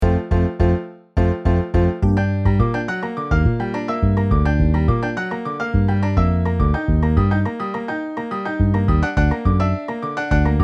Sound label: Piano Bass MIDI